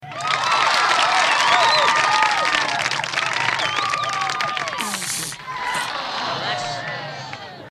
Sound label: crowd clap